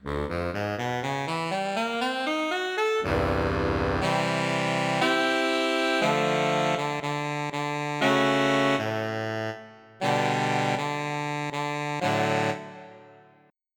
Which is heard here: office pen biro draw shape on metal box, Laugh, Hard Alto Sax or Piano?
Hard Alto Sax